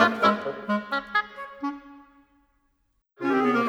Winds, Orchestral

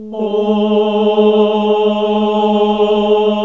OOH G, NUNS AAH OOH; Sound & Vision - Gigapack II

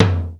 M615 TOM1; Crypton - DrumBase5000; BABY KITS

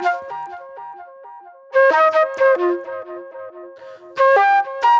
NY 70's Delay Flute; Session 01 - Benedetta; Sonic Foundry (Sony Creative Software); Manhattan Flutes

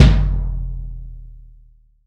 GONG BASS

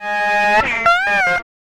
Lap Steel; Trip-Hop

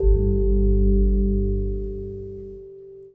Gong; Sonic Foundry (Sony Creative Software) - Friendly Fire; Single Gongs

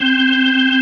B3 C 3-3, ORGAN; AKAI S-Series CD-ROM Sound Library VOL-1

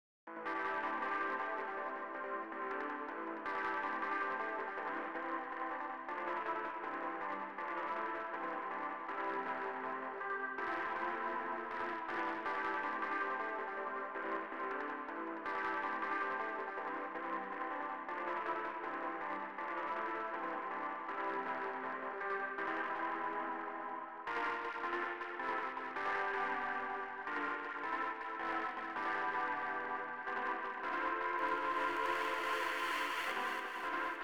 ep delay

